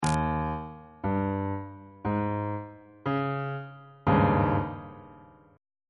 g sharp major 7th suspended 4th 2nd inversion on bass clef